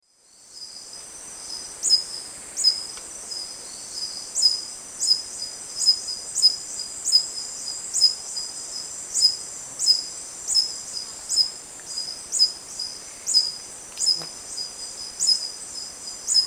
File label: Phaethornis malaris, songs at lek; S. America; Eastern Lowlands